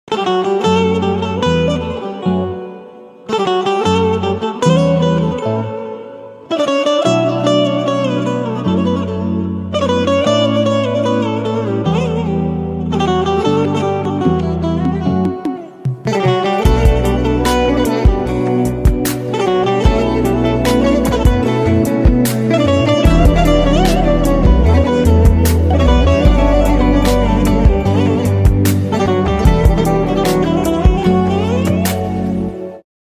elektro baglama studio recording

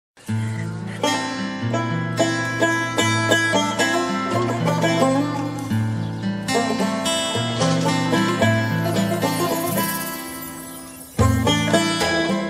diz muzigi slow versiyon; gizem karaca ve guzel koylu

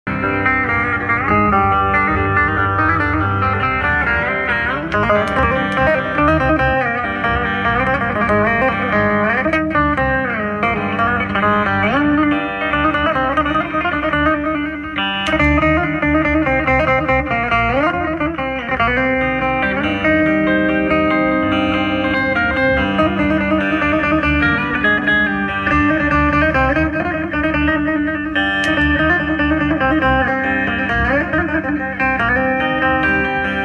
enstrumental

